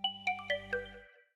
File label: popup down; ui; muqin; lineageos; vayu 19.1